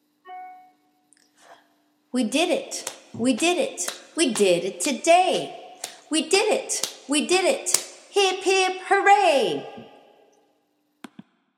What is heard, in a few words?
celebration chant we did it